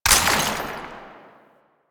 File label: Ammo Pickup Blast; Ammo Ability; DE; Warframe; Frumentarius